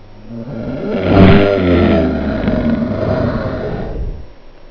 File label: bc die; monsters; spitter